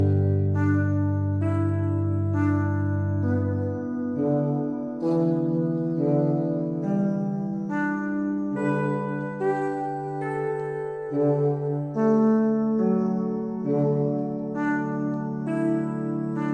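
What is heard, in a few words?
Russian horn band; Russkaya rogovaya kapella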